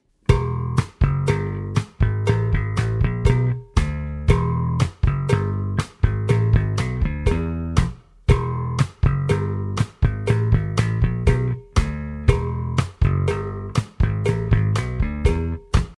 Slap Bass Groove

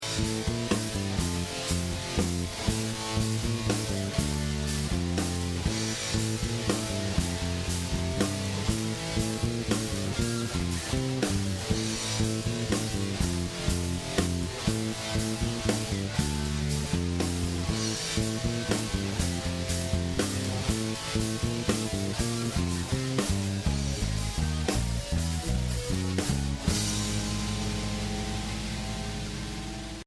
Straight Eighth Rock Signature

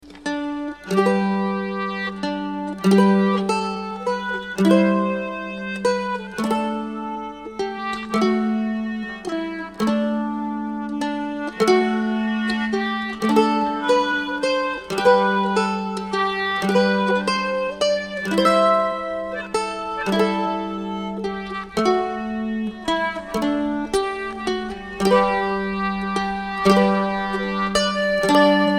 Mandolin

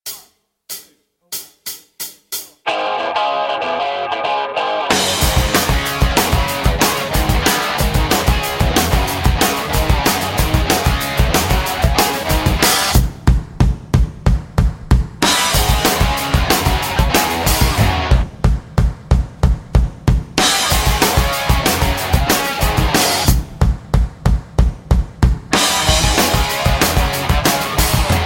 No bass

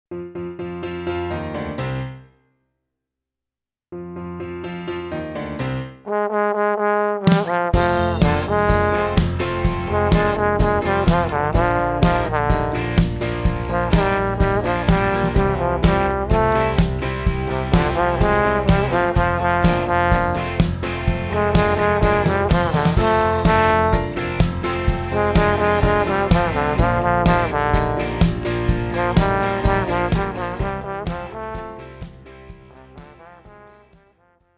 Trombone

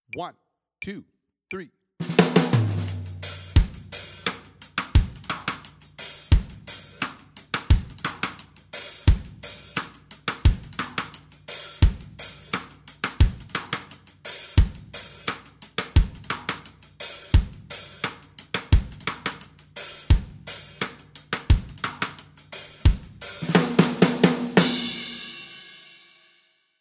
Reggae

